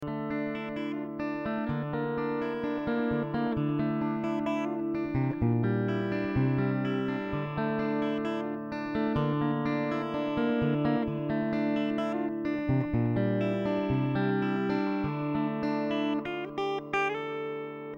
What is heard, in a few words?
puldin clean, guitar